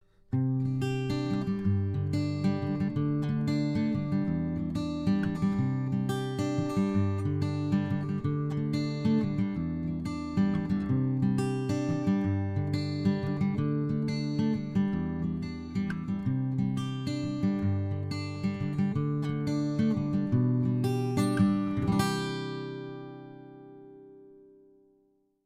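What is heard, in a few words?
U87 picked guitar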